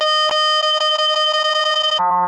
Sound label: three second countdown; Code7